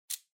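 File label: Soroban Noise, abacus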